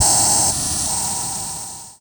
Title Transition Blast; Production Element Title Transition

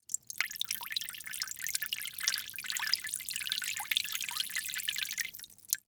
Liquid Water Water Pour Water From Watering Can

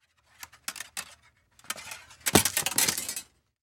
Impact Wire Drop Metal

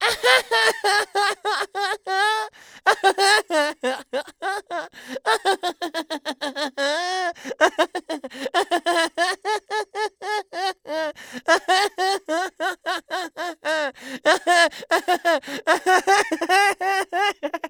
Human Male Laugh Boy Laugh Voice Long

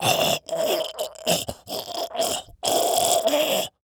Human Male Choking

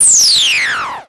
Multimedia Internet CD-Rom Flash Hi-Tech

